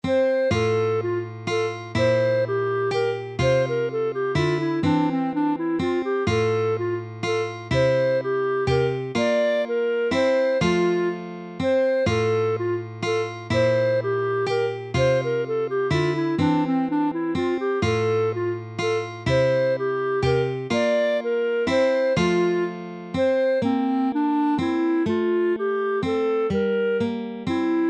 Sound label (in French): valse en fa majeur; lewis alastair